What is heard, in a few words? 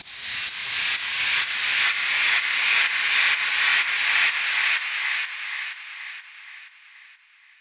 noise filter down echoes, drum loops and breaks